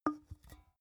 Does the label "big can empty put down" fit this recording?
Yes